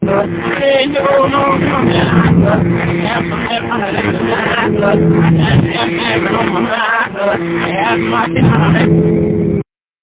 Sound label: breath